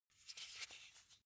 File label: car flip key handling short